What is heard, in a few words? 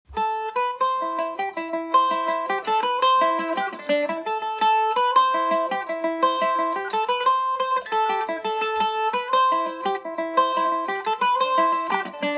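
A dorian md304, irish mandolin; geese in the bog; Eastman MD304 mandolin; background noise minimised using Audacity software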